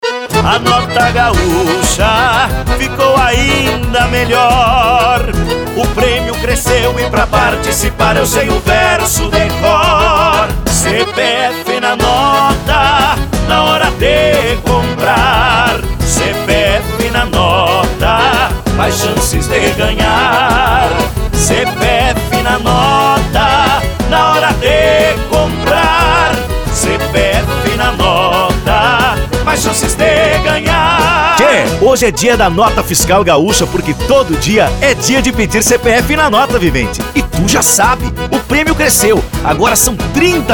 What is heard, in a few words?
Jingle